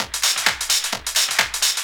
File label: drum 130, techno trance drumloops